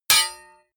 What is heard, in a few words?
block metal small